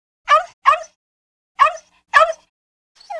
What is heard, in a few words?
dog bowwow